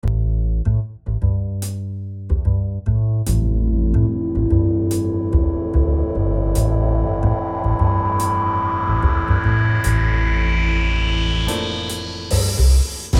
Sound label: swing